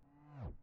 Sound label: swoosh; ludumdare32